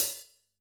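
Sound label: Closed Hat; drum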